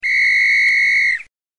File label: Referee